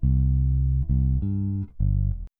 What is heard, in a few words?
Blues C Bass One Bar; Blues-loops-no-key-change; 100